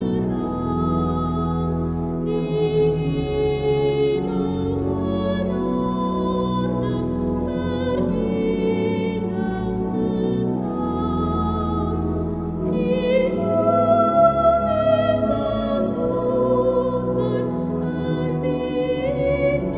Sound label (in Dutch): solist